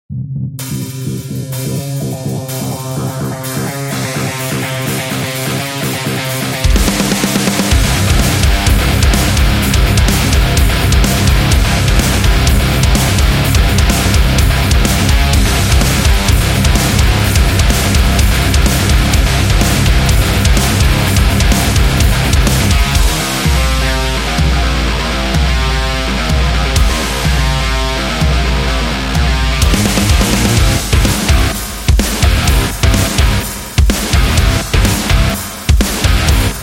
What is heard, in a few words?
Metal Music